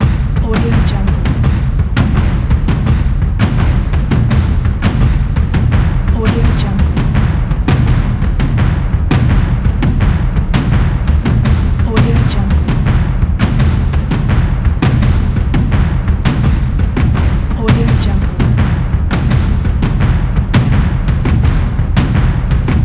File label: war drum loop; amusic7